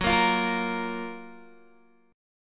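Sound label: G flat Major 7th G flat Guitar DADGAD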